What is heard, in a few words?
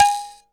THAMB PIANO, AFRICA